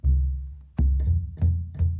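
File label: Calm Far, music; from dust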